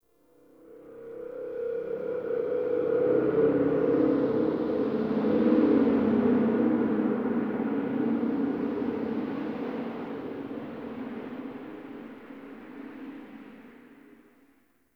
Dark Wind; Warframe; Ambience; Nineteen Ninety Nine

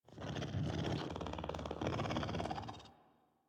Pet Purr; Enemies; Warframe; Catbrow; PetShip

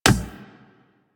Beach Ball Floof Kick

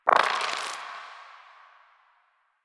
Roll Dice; Warframe; UI; Cetus Vendors

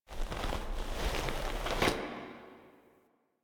Foley Struggle; Cinematics; Warframe; Orbiter Cradle

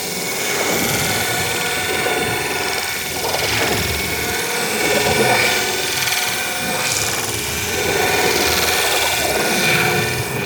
Pickup Dispenser Loop; Tau; Twelve Minute War; Pickup Dispensers

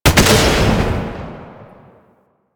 Sniper Alt Fire Shot; DE; Warframe; Frumentarius; Exalted Sniper

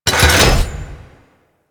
Fortress Gun Mounted Turret Retract; Ambience; Warframe; Grineer Fortress

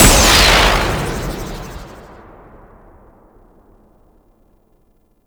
Infested Golem Beam Fire; Warframe

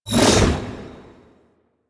Hammer Glass Whoosh D; Hammer Glass Prime; Warframe; Weapons

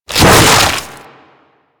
Single Tentacle Swing; Warframe; Infested; Emissary Ancient Tank

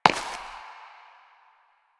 Step Ice; Warframe; Koumei

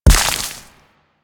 Nechro Mech Step Surface Foliage; Step Surfaces

